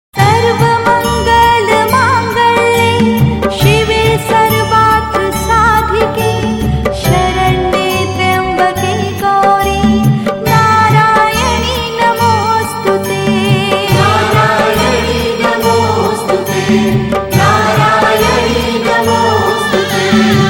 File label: adhya skati mantra